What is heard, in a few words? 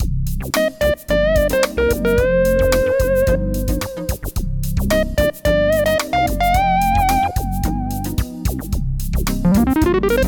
mini delay